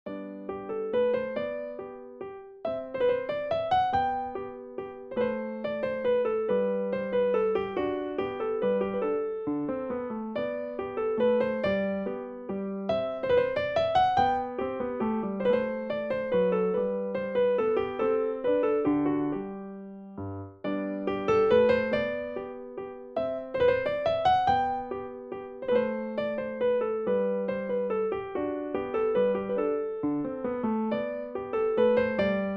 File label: menuet